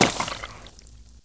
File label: flesh, violence; Benny Placeholders